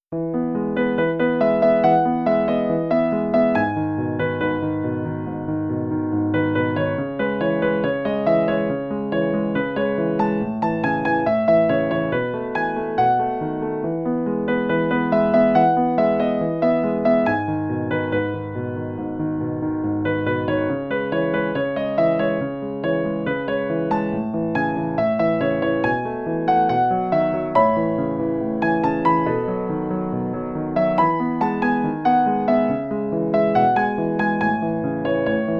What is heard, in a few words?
Piano Arrange Version; solfege vocal collection; Cross road